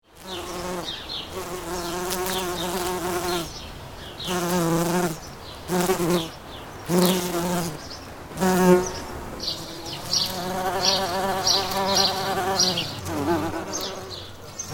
Birds and bees in a garden in the summer.; Insects; Animals

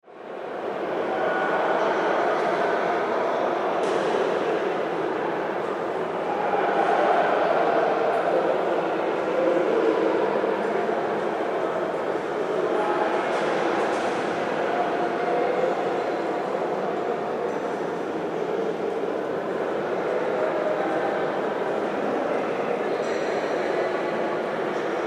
Ambience Train Station Heavy Reverb; Backgrounds; Train Stations

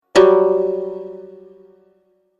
Message Error, beep

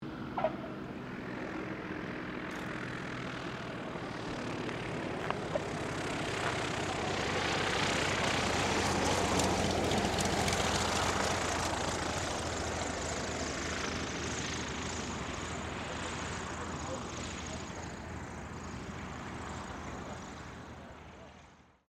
AIRCRAFT CT4A LANDING, military trainer aircraft; Airplanes

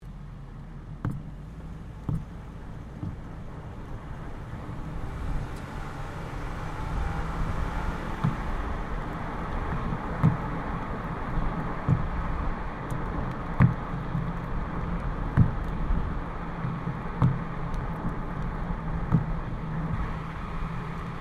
Car tyre noise accel decel catseyes; Cars (Sound Effects:Vehicles)

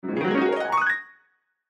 Piano Gliss Ascend; Piano Gliss Ascend Fast; Pianos; Blastwave; Sound Effects:Musical

